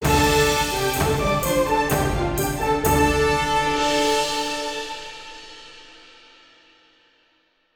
Victory

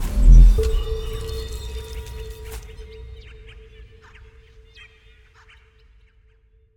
Jingles; Semblance; AREA BACK TO LIFE 2

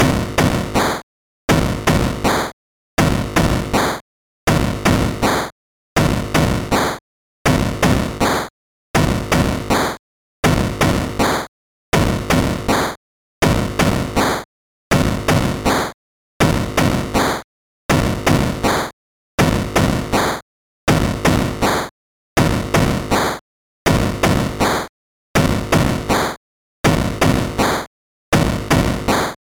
End of Quarter; NBA In the Zone 2000; GBC

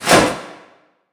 fashion room sfx, light panel lock

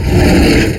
barn door open; birthday party bash; wii

